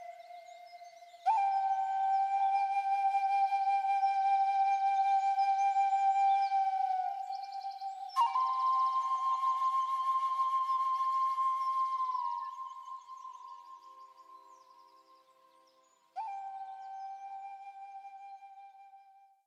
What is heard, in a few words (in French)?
Indien flute